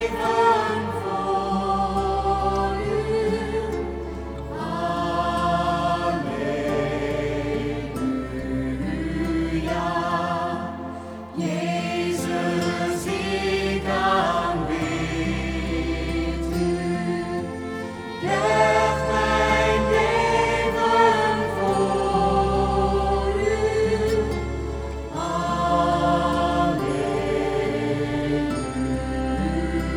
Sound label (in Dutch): samenzang